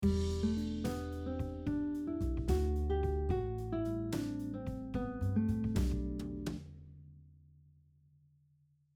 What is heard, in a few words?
Diatonic chords example, G Major scale over the following the chords: E minor 7, D Major, A minor 7, and B minor 7, guitar